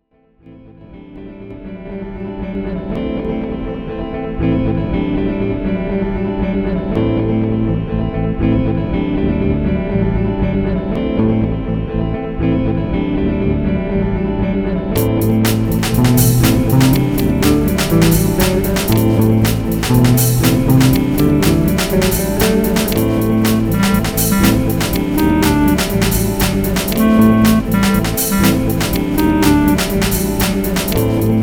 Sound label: electro